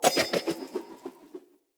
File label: Throwing Knives Projectile; Pothia